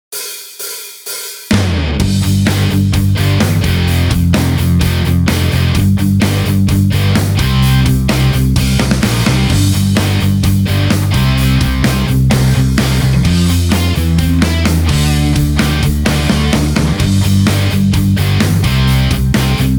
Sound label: rock